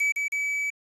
Tile Match (Whistle); Shanghai 2 Dragon's Eye; snes

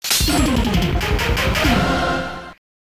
COIN; the ninja warriors; arcade

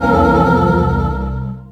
Collect Key

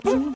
down test

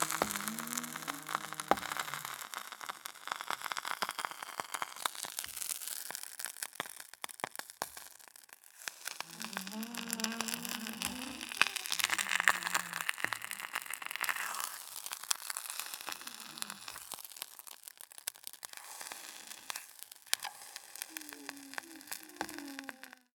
Clothes Burn

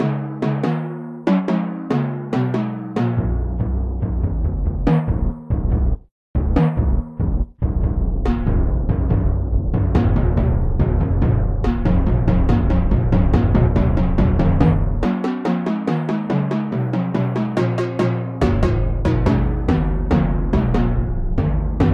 Drum; Badh'r Kilnfest; The Bard's Tale Remastered Trilogy

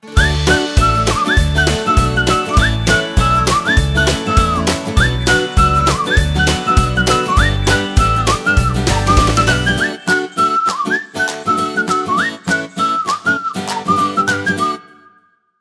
Victory Whistle; gamerip; shellshock live; android ios linux macos ps4 windows xbox one

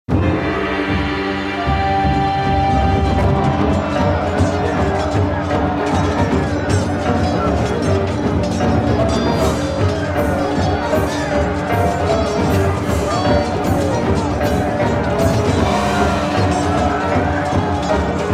oe battle w sfx; Oriental Empires

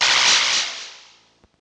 ghost appear

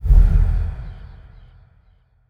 shadow teleport

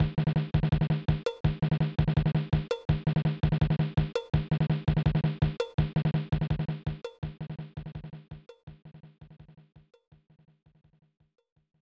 Drumbeat; fun n games 1994 snes

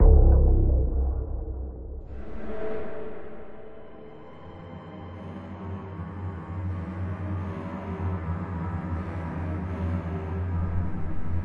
Cave Music; Solus Project

